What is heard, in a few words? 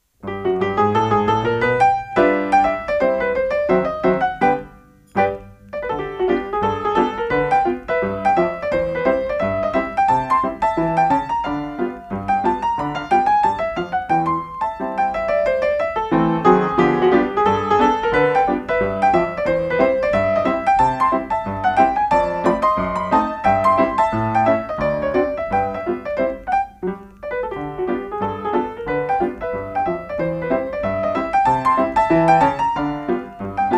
ragtime